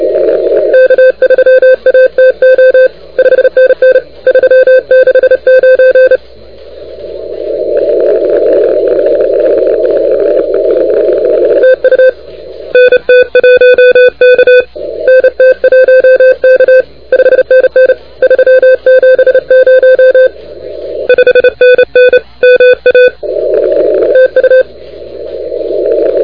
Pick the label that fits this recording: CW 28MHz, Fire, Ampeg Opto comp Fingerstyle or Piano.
CW 28MHz